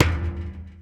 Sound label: Street Ball Metal Back; SFX Kit; Street Basketball